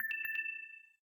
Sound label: Low Battery in call